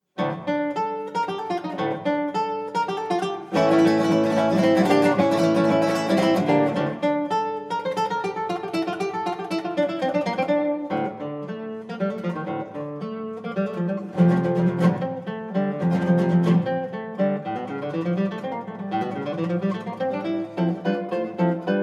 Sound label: sonata vivo